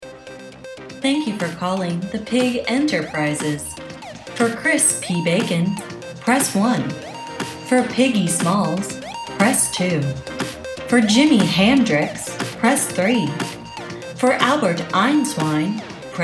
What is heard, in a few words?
menu music pig